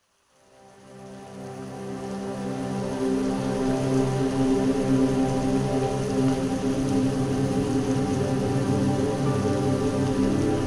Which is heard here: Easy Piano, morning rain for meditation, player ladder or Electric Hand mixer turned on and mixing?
morning rain for meditation